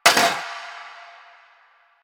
Cafe Chair Short; Warframe; Ambience; Nineteen Ninety Nine

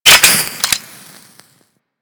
Magnum Reload Start, Weapons; Warframe; Tenno

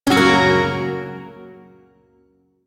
Shawzin Yo Chord; Warframe; Duviri Shawzin

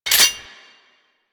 Cannon Mech; Grineer

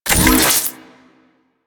Briefcase Scythe Equip; Crp Briefcase Scythe

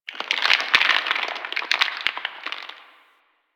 Tree Creak; Warframe; Quests; TheNewWar; Ambience; Drifter Camp

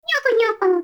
baby vocal tired; Warframe; Nokko Colony

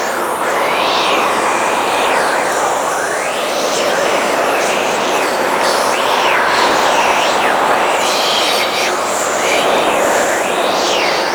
Loop; Warframe; Weapons; Archwing; Powers; Archwing Healer

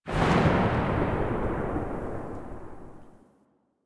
Archgun Tail; Warframe; Archwing; Weapons

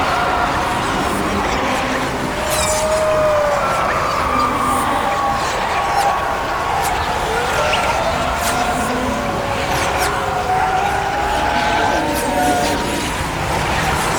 Scream Loop; Warframe; Tau; Circles Of Hell

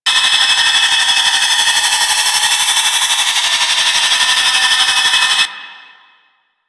Infested Alad Collar Proj Loop A, Attacks; Warframe; Infested Alad V

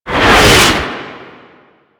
Tank Cannon Projectile Whiz; Enemies; Weapons; Warframe; Lasrian

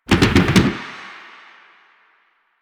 Slide Boost Backfire, Motorcycle; Motorcycle Ninety Nine

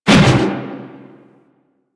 Fist Whoosh; DE; Warframe; Weapons; Archwing; Melee; Grn Arch Regor Fists

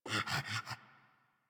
Puppy Idle Pant Grunt; Enemies; Vocals; Kubrow; Warframe

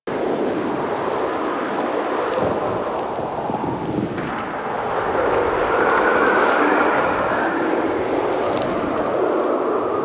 Settlement Ext Amb, Exterior

